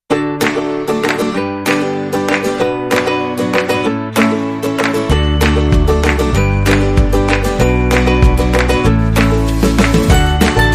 Upbeat Sugary Folk

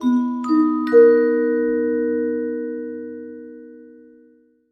Good Morning Melody

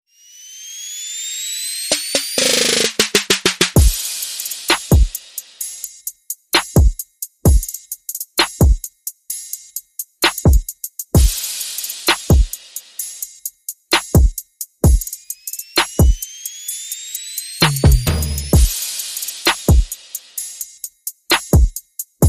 Perc and FX Only

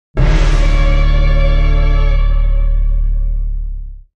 Hit

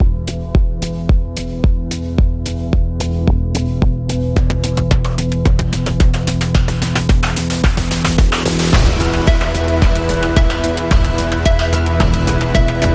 No Piano

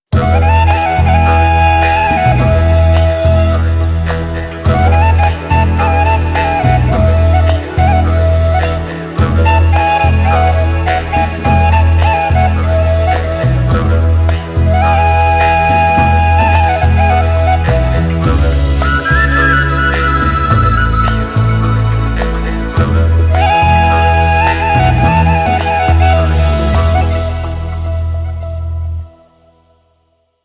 Lowland Acoustic, No Vocal SFX

